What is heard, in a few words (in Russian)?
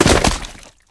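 короткий яркий звук упавшего тяжелого предмета в воду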